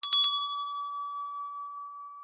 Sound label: pull bell short